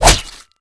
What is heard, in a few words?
Weapon Atk